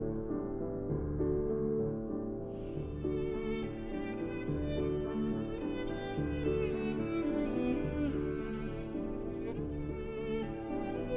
Violin and Piano in D

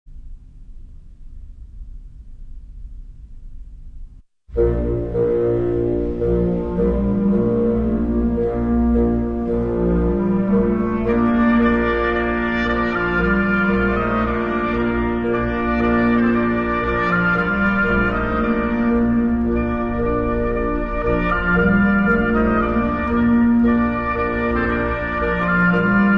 Carols for Woodwinds; BBC Concert Orchestra; Angels in our Fields

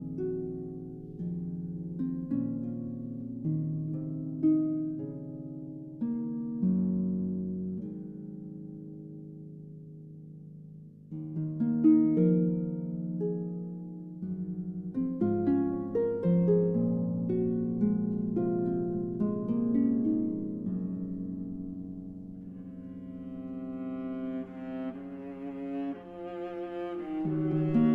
Harp Concerto Lullaby

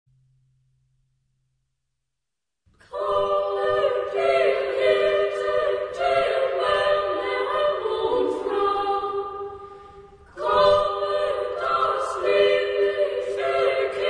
Boys Choir; Kommet, ihr Hirten; Come, ye shepherds

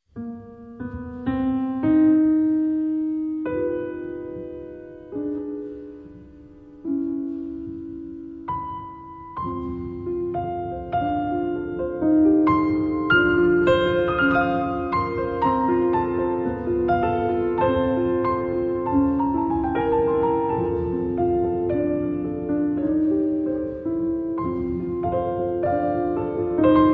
piano; Alessio Bax; Daisies, Op 38-3

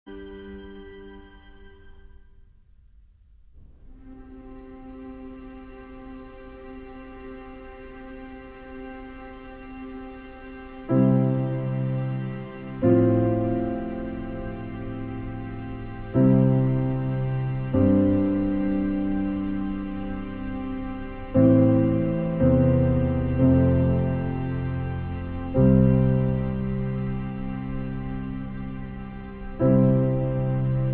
piano, and 12 Ensemble

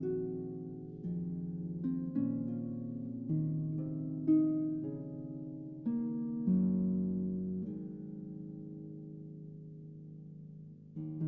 Harp Concerto Lullaby

